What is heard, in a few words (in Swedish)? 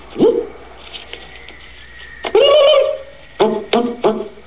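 Tornseglare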